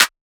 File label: clap spit, Hip-Hop; Drum One Shots; SD CAICOS Hip-Hop Sample Pack; Slate